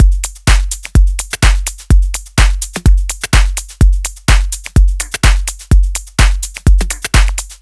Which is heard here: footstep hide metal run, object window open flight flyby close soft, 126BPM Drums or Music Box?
126BPM Drums